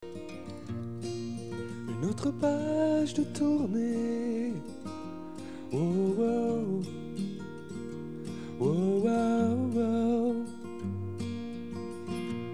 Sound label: Harmonium Chords With D